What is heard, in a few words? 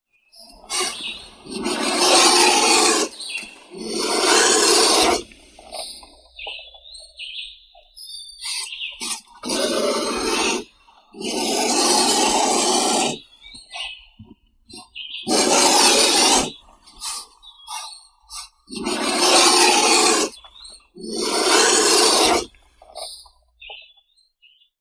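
black vulture, Aegypius monachus; grif chernii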